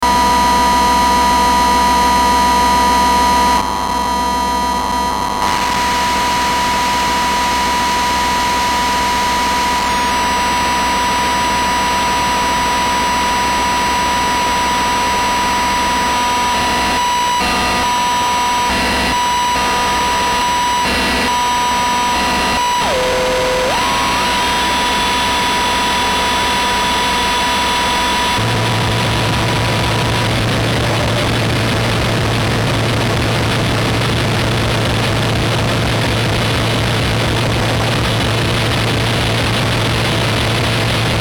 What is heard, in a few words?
inharmonicity of overtones